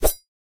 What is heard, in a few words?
join in; sling driver